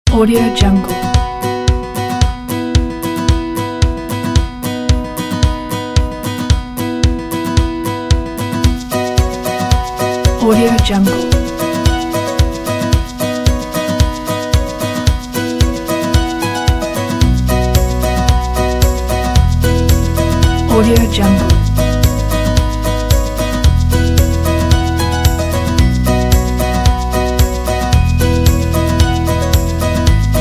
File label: uplifting motivational acoustic indie folk